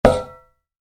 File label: Shovel, Impact; Dull Hit; Yard Tools; Yard Tool Sound Effects; Yard Tools (Sound Effects:Tools); Stuart Duffield